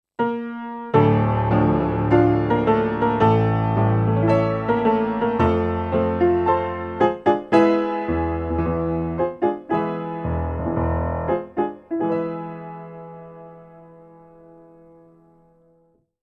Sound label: Vintage Grand Untuned Etude